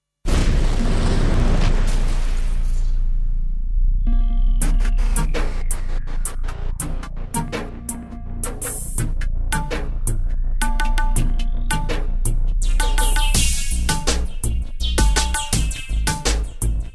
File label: Instrumental